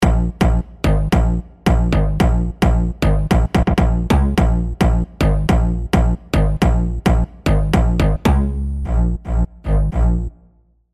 BASSES SLAP IT

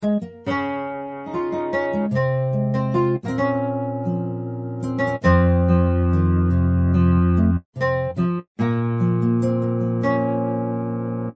NYLON

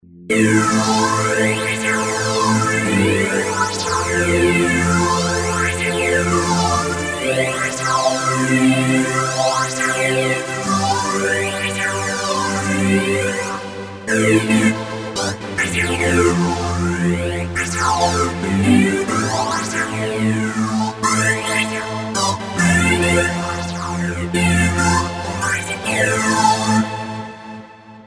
Phaser Airwave

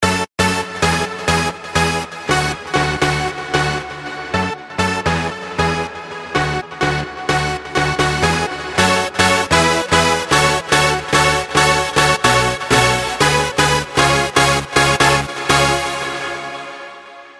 ARMONIUM

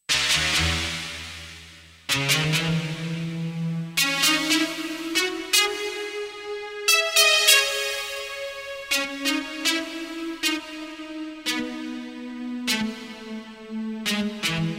5 cents lead

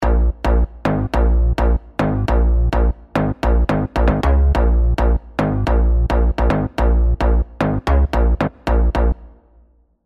BASSES SOFT BODY

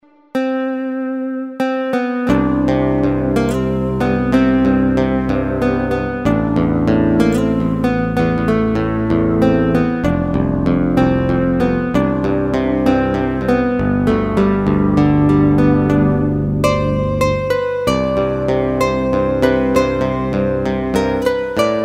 Nylon Guitar

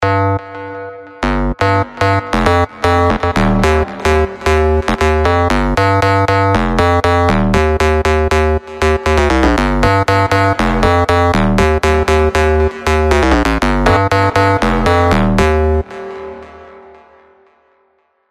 DARK SQ BASS

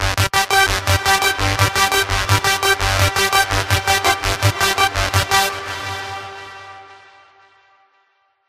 DIRTY SAW

